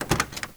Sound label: Mustang Door Open; Automobiles